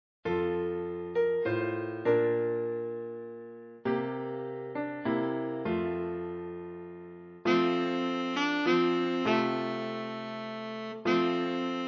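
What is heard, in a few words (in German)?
Stille Nacht heilige Nacht, Altsaxophon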